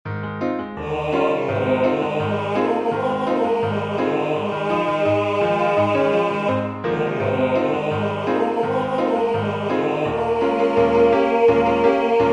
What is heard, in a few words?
tenors